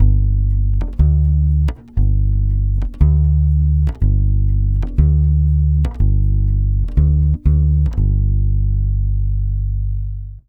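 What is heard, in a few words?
120 BASS G minor, Brazil Bossa; USB Soundscan; AKAI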